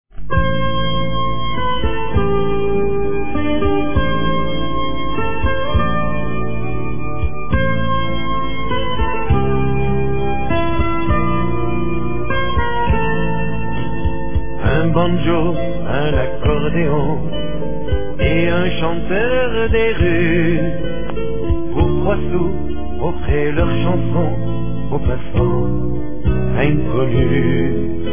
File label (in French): l accordeon dans les rues de paris